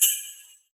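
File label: J BELL, Drum Machines; EdgeSounds; Drum Mashines VOL-1; X-DRUMS KIT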